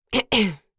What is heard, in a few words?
Adult female clearing throat